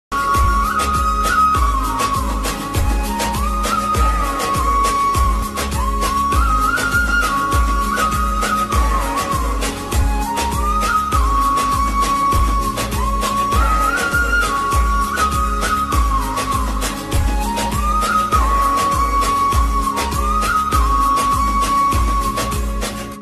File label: ringtone bgm music ringtone